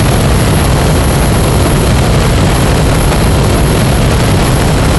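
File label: ship takeoff; Star Wars Knights Of The Old Republic I; XBOX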